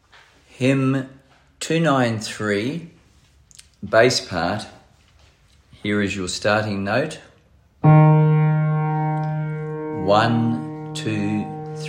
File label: Bass, hymns, choir parts; Now The Hour of Worship is O'er; Hymns for Jubilation